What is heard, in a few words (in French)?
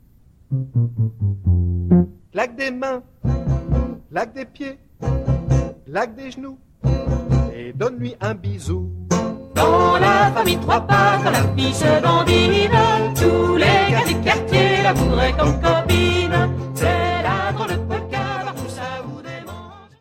polka - France